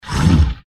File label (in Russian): Короткий рык тиранозавра